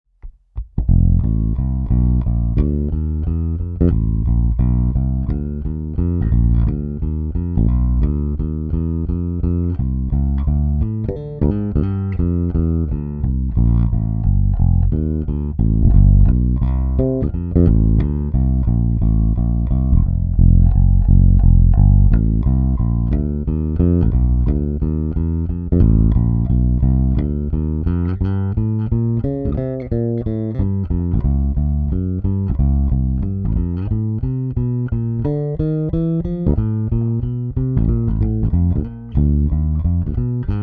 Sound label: Walking